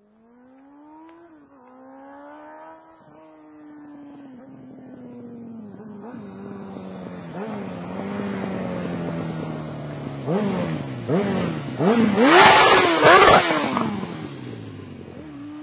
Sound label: burn, motos; Des burns jusqu'à la rupture; GSX-R